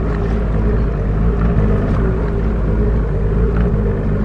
jetski idle loop; hl2

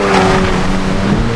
jetski bounce; hl2

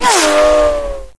near miss, weapons

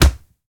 punch general body impact; Gamemaster Audio; Punch Sound Pack

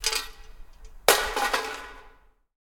Throw Metal Thing; Blond Panda; Decaying Factory

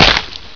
bust flesh, debris

